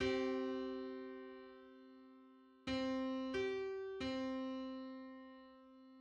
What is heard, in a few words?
Three hundred seventy seventh harmonic on C